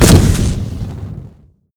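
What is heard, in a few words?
magic fire big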